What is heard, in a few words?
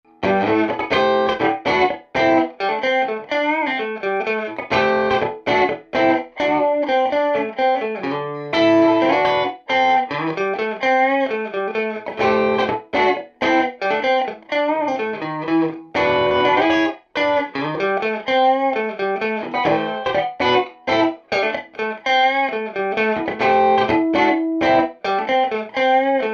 strato cranch, tweed deluxe